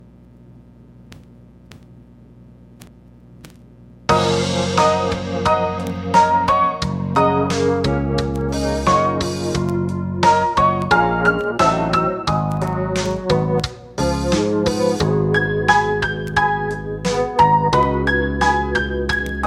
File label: Lullaby